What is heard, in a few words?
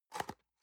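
box small close medium, Büroarbeit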